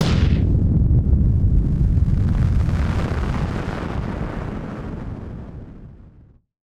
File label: Firework FX; musicradar; bomb factory